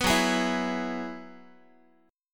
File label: F sharp 7th Suspended 2nd Suspended 4th down Guitar; F#7sus2sus4 strummed